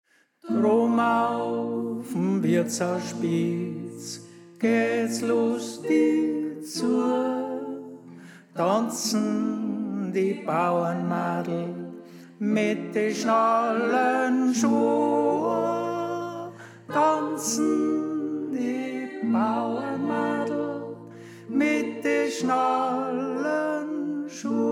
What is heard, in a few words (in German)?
Tenor; Drobn Auf n Wirtshausspitz